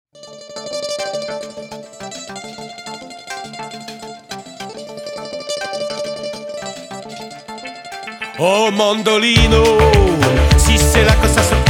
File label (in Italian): mandolino